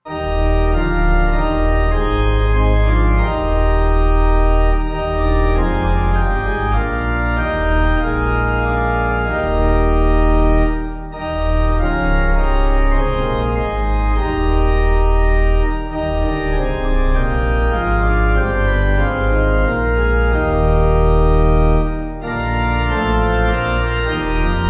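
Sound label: Eb, Pipe, hymn backing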